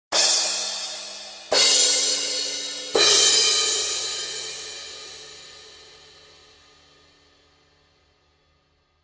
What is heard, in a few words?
Paiste Crashes